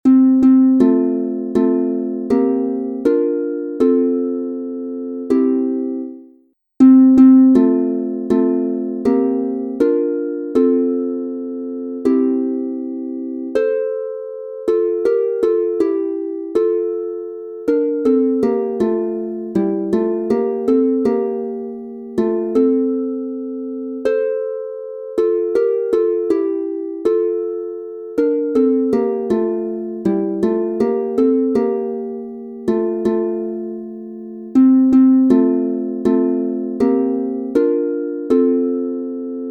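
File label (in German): F Dur 3 stimmig, Harfe; O ce veste minunata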